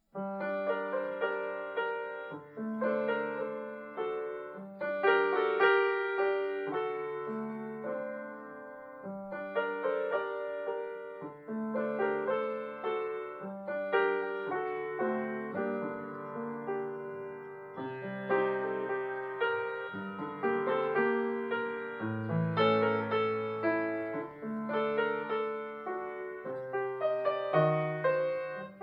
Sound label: the dance music box